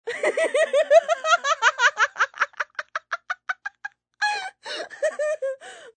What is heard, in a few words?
Laugh Female